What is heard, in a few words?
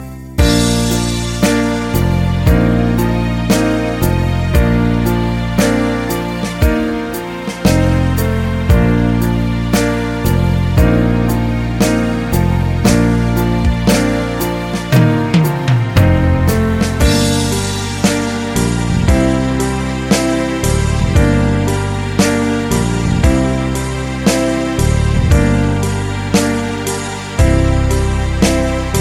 piano lounge i phone 6 s ringtone